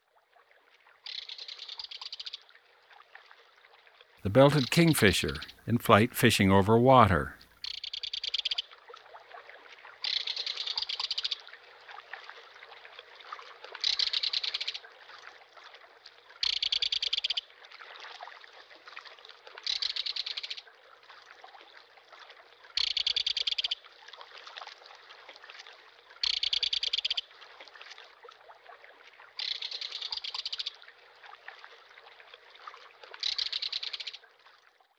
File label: Belted Kingfisher; Eastern North America; Dan Gibson; Wildlife Identification By Sound